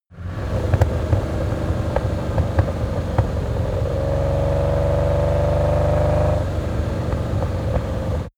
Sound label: car lamborghini countach small accelerate clatter